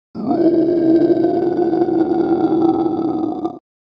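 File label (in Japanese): 叫び声（ウオー）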